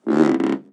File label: Hollow Rubber Creak